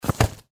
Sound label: Ragdoll Fall